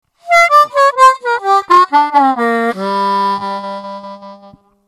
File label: Turbo G Blues Scale, harmonica